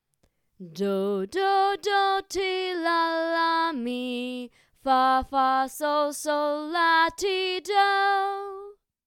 solfa melody

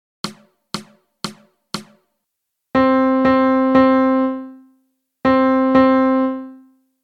Melody; Lesson 1 Hard; Read Rhythms

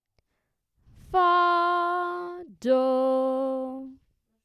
subdominant tonic

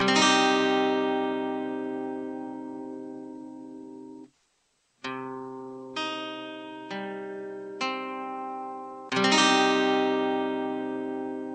c minor; minor keys

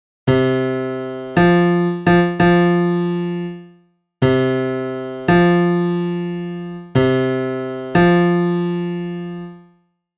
I IV V Perfect Fourth; Exercise Examples; Here Comes The Bride

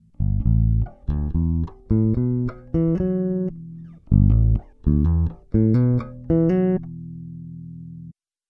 Electric Bass Hammer; Electric Bass Hammer Example

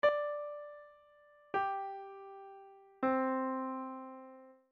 BASS NOTES ii V I

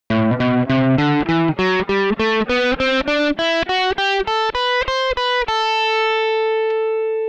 Dorian Mode Ear Training

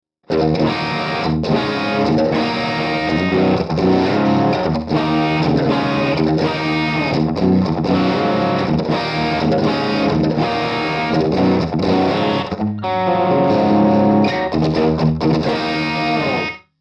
marshall jtm 45 all the way up

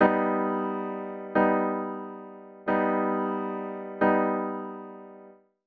G Augmented

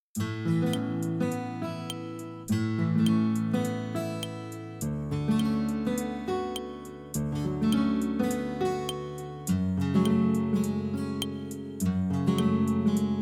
I IV vi V Pop A

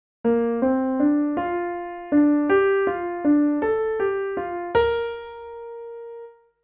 melody

